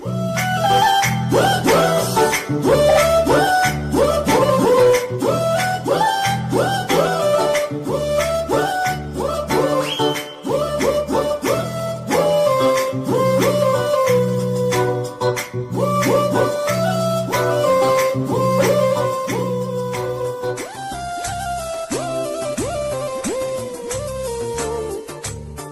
music ringtone

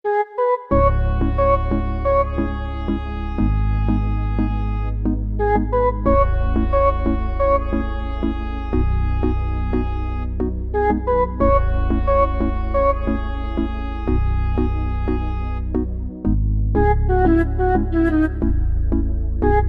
instrumental ringtone

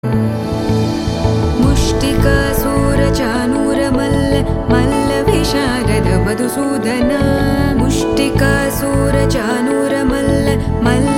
Telugu Ringtone

